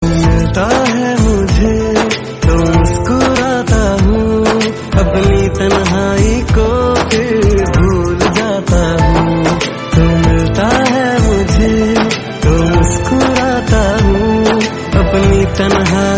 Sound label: Hindi Song, ringtone